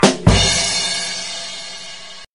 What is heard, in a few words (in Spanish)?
bateria chiste